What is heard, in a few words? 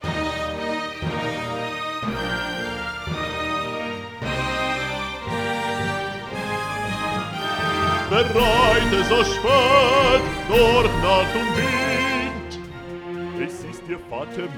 Orchestra Voice Mix; The East Front